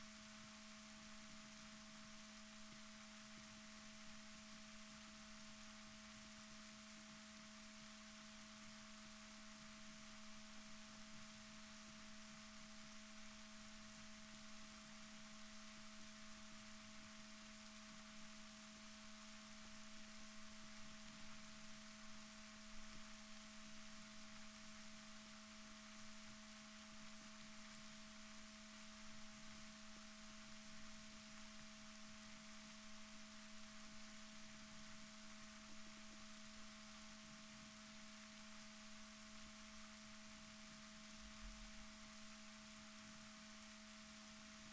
rorquals positif, Balaenoptera Physalus Chile

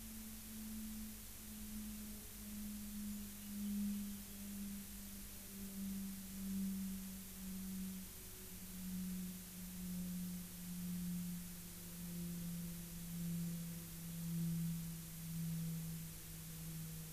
rayol farm; SEAMED

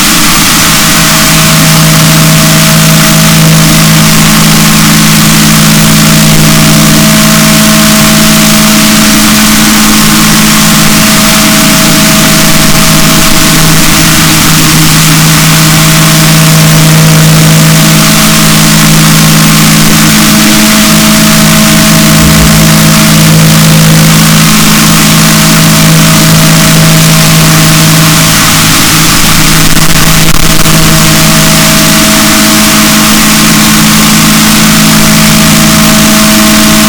rorquals negatif, boat

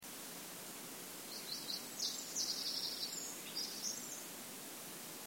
Troglodytes troglodytes; SETE

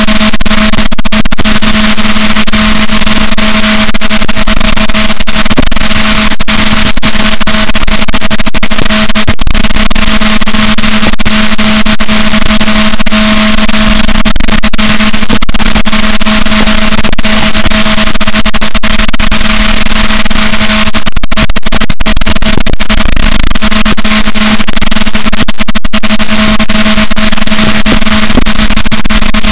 rorquals, Balaenoptera Physalus, boat; Chile; SNR -6dB

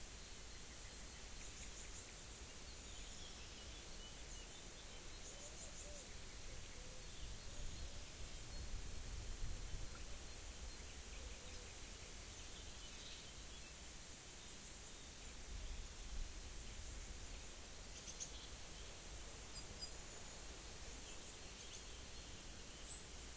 rayol farm; SEAMED

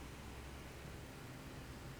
pulses, BALAENOPTERA; MONACO 2022-07

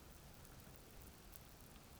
pulses, BALAENOPTERA; MONACO 2022-07

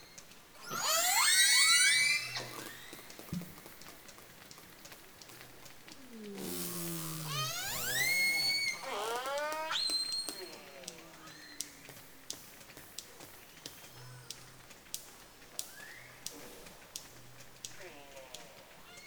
Cocktail Party

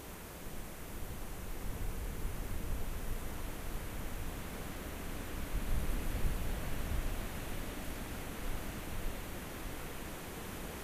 rayol farm; SEAMED

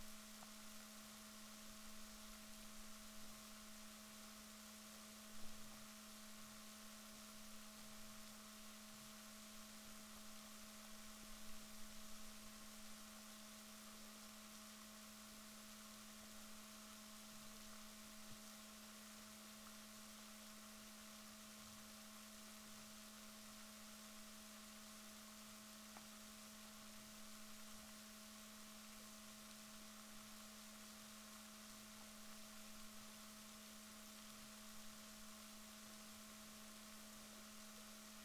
rorquals negatif, Balaenoptera Physalus Chile

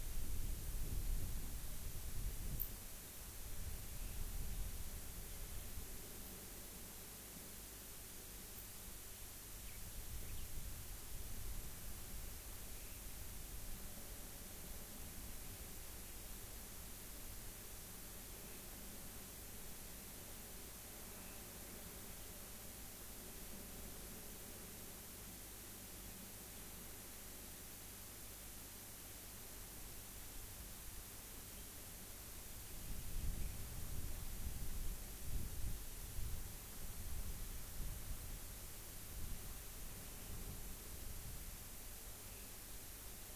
rayol farm; SEAMED

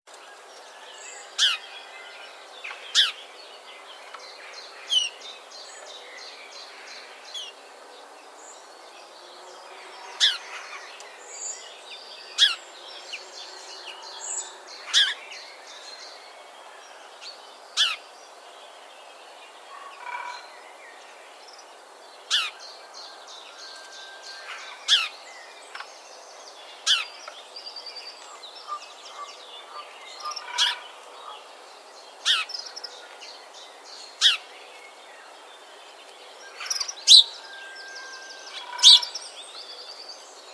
Passer domesticus; SETE

